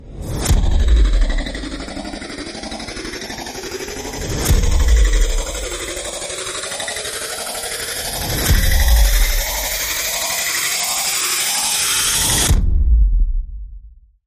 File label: Rise Rhy Kinetic No Drums